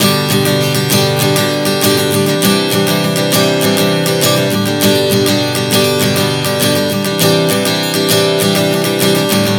G-Cadd9 100 bpm, acoustic guitar chord progressions